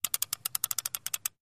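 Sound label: Revolver Spin Chamber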